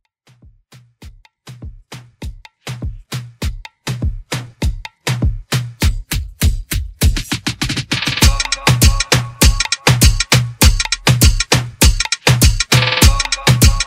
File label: Moombah; Redrum